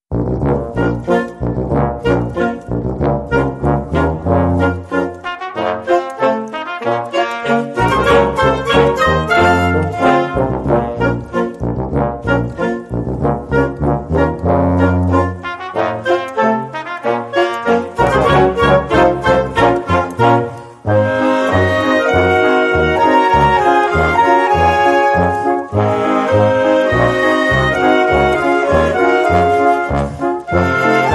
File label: No Percussion